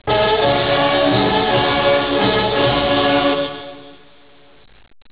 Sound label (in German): tusch, karneval